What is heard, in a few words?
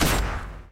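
rkt fire, rocket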